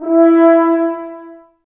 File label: Brass F.Hrns E; Miroslav Vitous - Woodwind Brass Ensemble